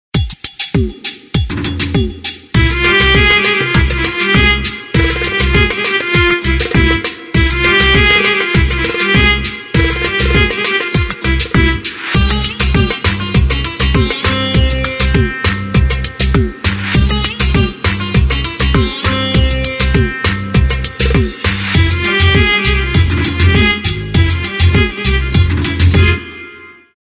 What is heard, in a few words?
Arabian night; Samsung GT-S7330